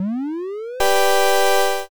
summon

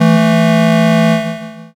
buzzer end

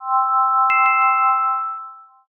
save point

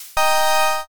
appear

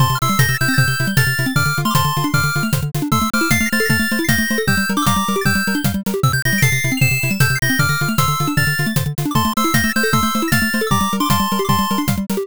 bgm drive

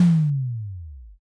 impact don

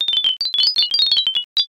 pachinko

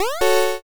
enemy appear

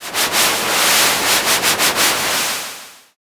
river

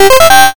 start

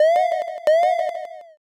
warning light

